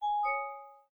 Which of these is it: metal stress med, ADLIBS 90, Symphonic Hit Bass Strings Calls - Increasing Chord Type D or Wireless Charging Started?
Wireless Charging Started